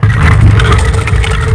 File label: gate move; Nintendo; wr64